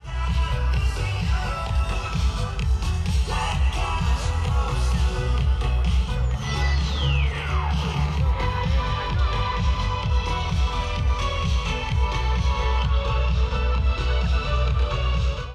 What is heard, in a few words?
DJ set